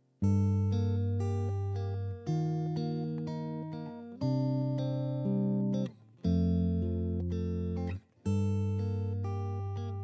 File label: breath